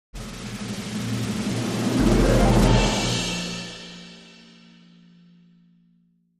Snare Drum Roll To End Impact

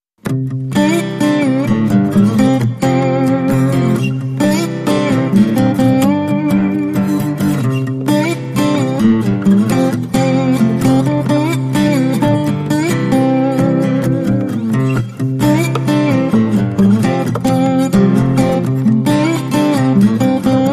Happy Tom, No Drums And Bass

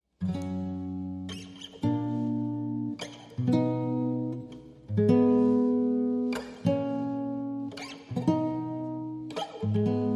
Guitar Only

